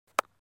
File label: knock